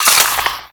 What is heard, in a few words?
Mech, Weapons Cannon; Kuva Zarr